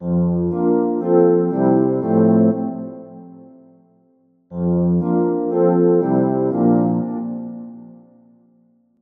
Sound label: rhine ringtone